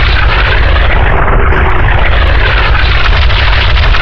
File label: Vehicle Weapons; Red Orchestra 41-45